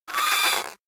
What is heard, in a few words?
bat voc mumble